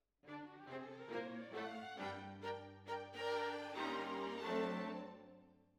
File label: Violas Over